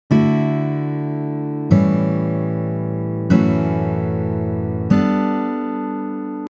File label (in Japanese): エモい; emo code 4types 3steps